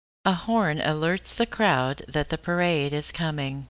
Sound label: horn